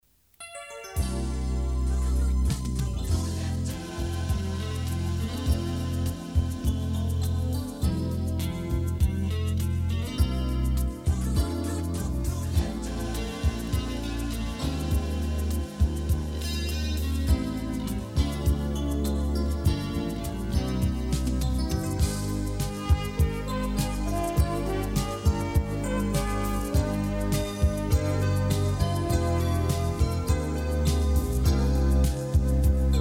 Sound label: Jingle